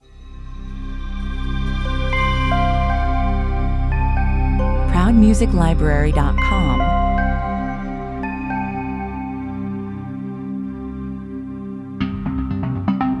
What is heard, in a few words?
futuristic opener without beats 120 bpm